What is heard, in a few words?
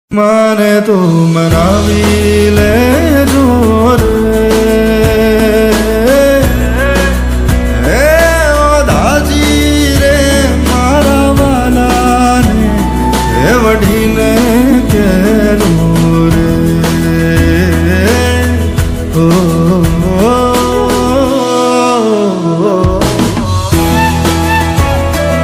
gujarati ringtone